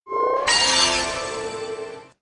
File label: Warp Pad